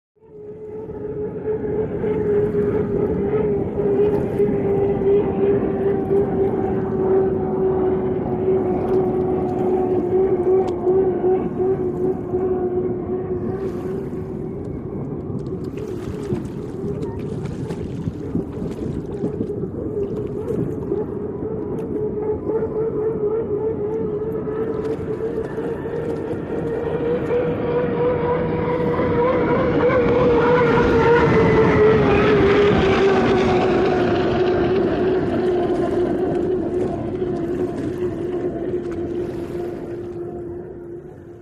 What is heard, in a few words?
Hydroplane By Ambie